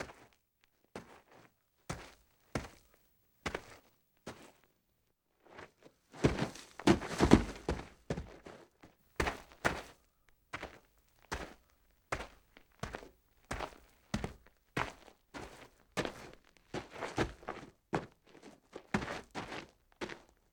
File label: Footsteps Gravel; Impacts; 1001 Sound Effects